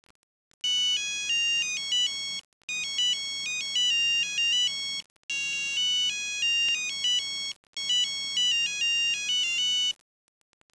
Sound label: ringtone